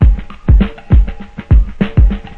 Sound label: 100 lo fi jungle, DRUM LOOPS and BREAKS; 091 to 100 bpm